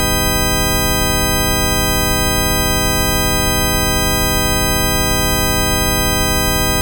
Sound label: 30 multitone flat; linearaudio